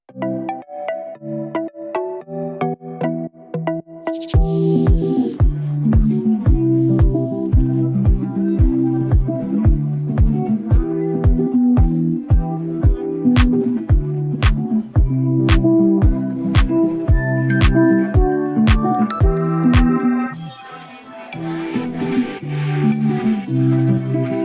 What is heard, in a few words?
Instrumental